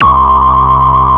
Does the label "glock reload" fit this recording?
No